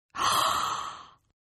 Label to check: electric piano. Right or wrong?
Wrong